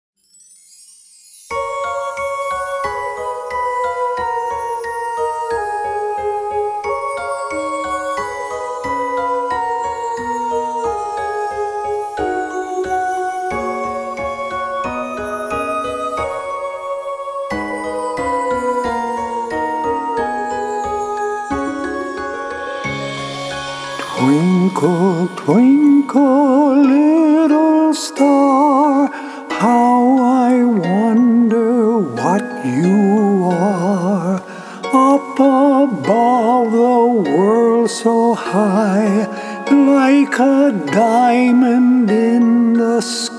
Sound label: Twinkle Twinkle Little Star